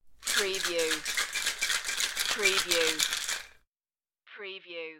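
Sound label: Ice Shaker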